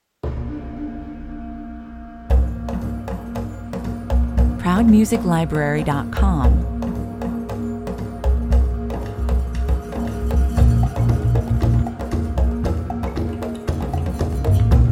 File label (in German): Treibender Rhythmus mit afrikanischer Trommel-Besetzung